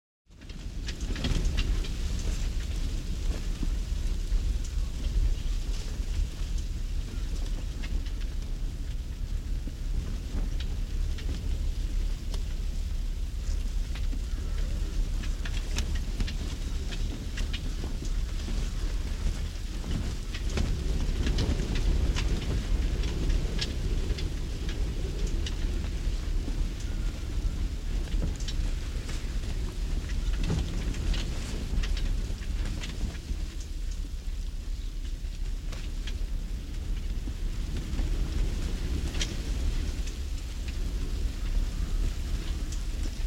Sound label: hearth; Ambient and Specific Samples